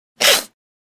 Boar Snarl Hiss Singl

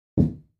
Free Weights Exerci

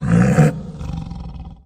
Tiger Growls Throaty; big cats

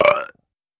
Belch Shrt; belching

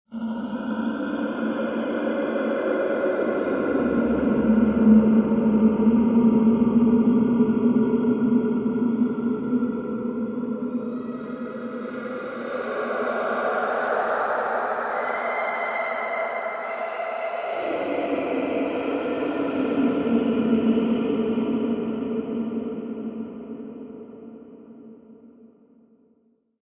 Ambiance Scream

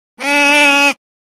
Otter Sea Call Whine

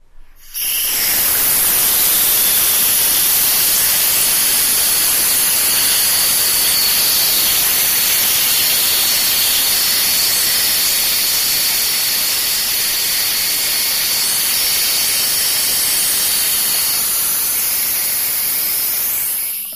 Water Steam Burst

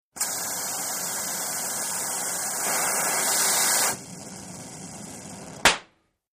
Weld Oxy Acetylene Ig, welding

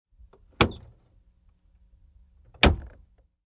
Aston Martin Trunk OC, ext. trunks; aston martin ext. trunks hoods

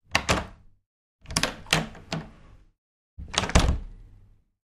Door Wood Open, Close x3

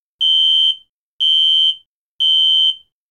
Alarm Whirring Synt; hi-tech-various

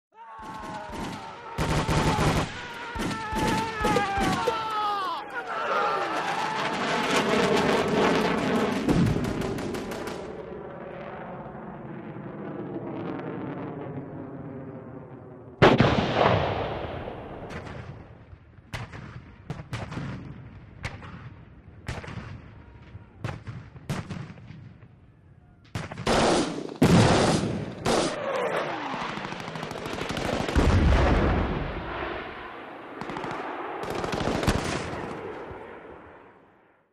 War Background Guns S; backgrounds

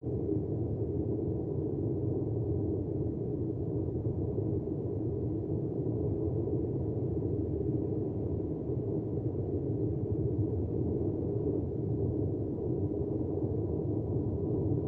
Ambiance Light Bass; drones & tones

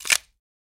Bone Crab Shell Break

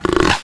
Leopard Breath Snort; big cats

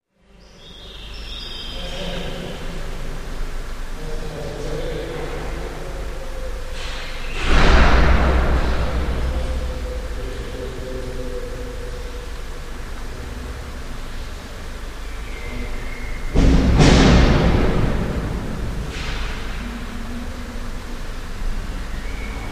Lg Echoey Corridor, hallways

